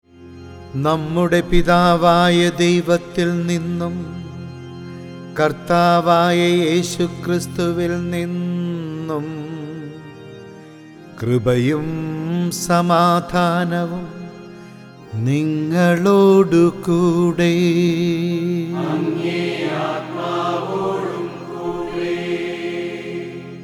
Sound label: Chant; Nammude Pithavaya; ordo cantus